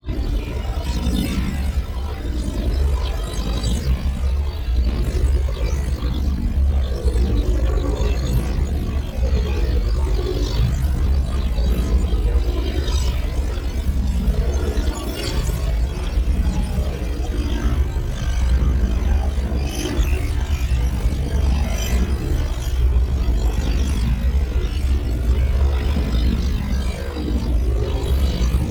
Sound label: NEON TEXTURE Bad Data; boom library